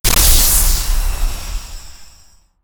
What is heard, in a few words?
Lightning Strike Aim Start; Evolve; Elder Kraken